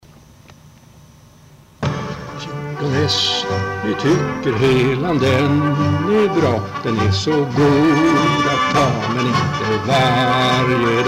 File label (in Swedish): snapsvisa med musik